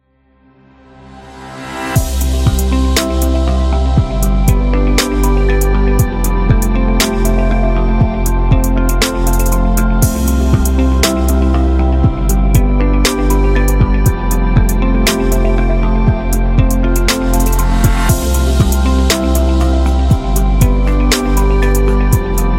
Chill

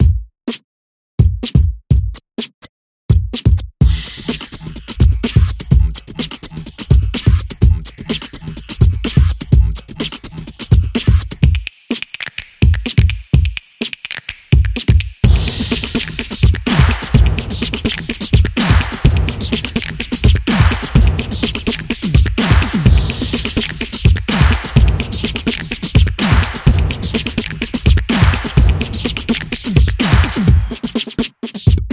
Beatboxing; football drums

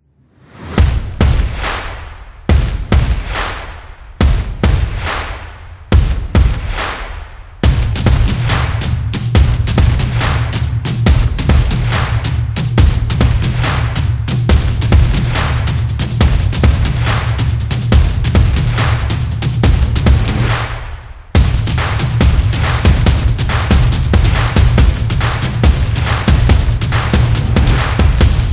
Sports Beat, sport drums

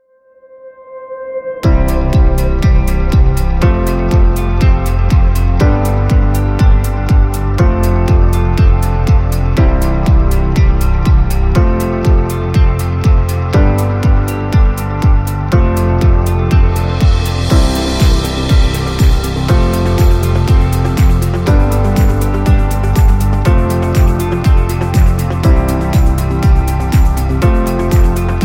Positive Inspiration, corporate music; corporate music vol 20

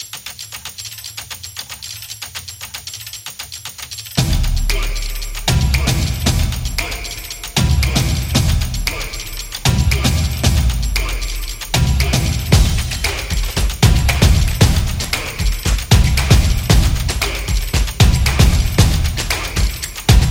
The Drumming; stomp and clap

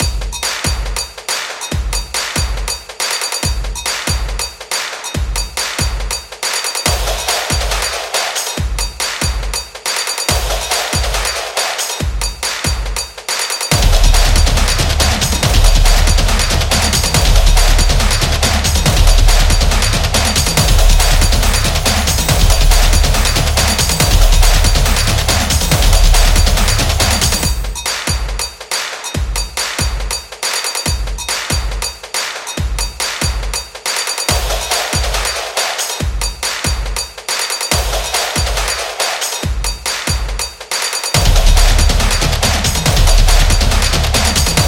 This Is Sport Drums

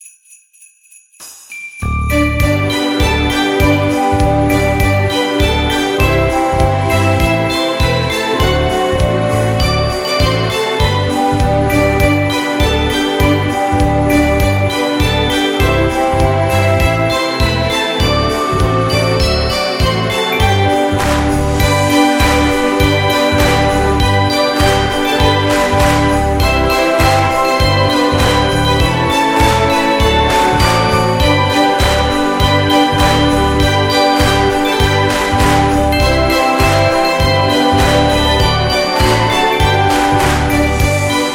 Christmas Music

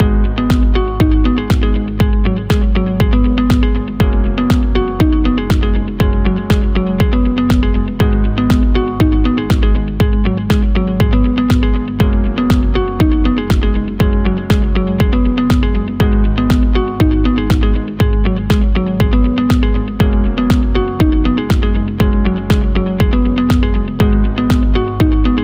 Background Loop; corporate promo

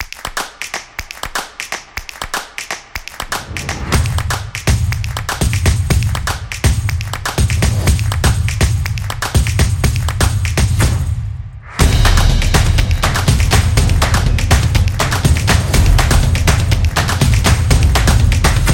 This Is Drum Dynamic; football promo

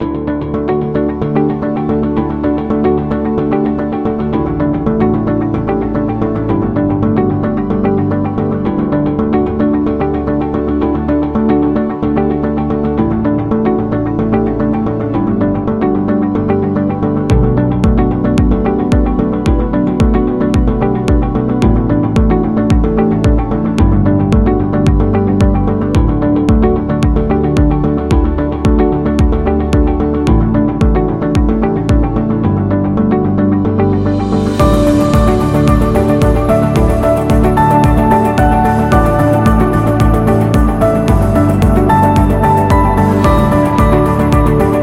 Corporate Background

